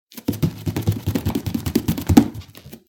フローリング裸足で走る音

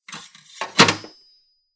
包丁をしまう音

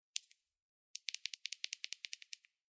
スマホの音量ボタンの音

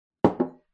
グラスを置く音

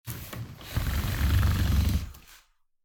畳の椅子を動かす音; chair

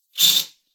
カーテンを開ける音; curtain

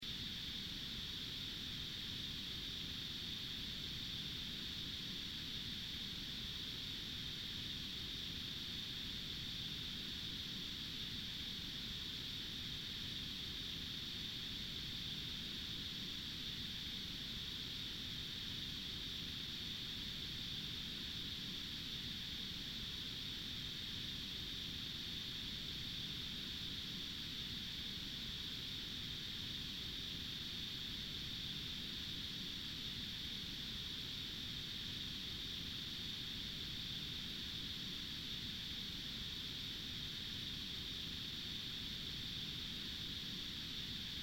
田舎道の虫の環境音

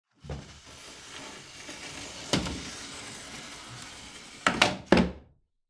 襖扉を閉める音; door

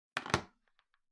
リモコンを置く音

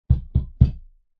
外側からを壁を叩く音

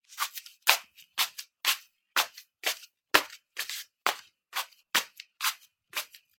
スリッパで歩く音; slipper

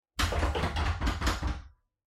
扉ガチャガチャ

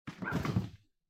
冷蔵庫の扉を開ける音

